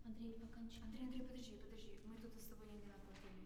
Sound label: piano low